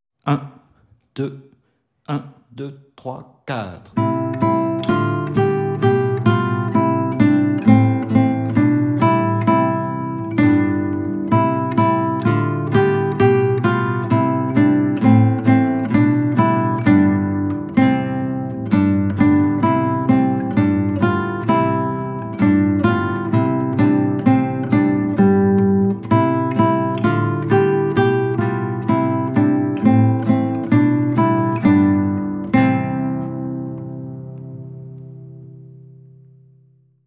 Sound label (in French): exercice 130bpm